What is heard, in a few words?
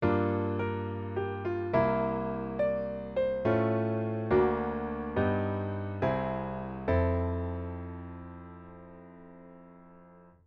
aug bass; symmetric chords; circle of fifths